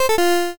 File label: gacha lose